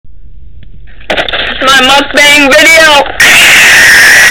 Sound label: screech; Muckbang